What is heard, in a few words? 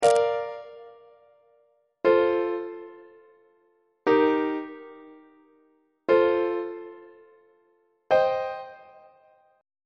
b flat major deceptive cadence on treble clef